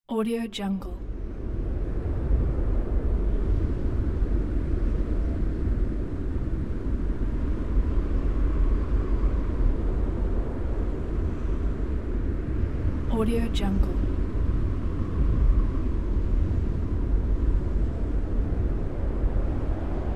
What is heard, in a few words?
wind